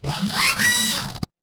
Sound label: mechanical servo